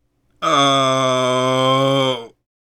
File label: Grunt